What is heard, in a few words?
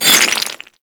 Melee Impact Cement; Warframe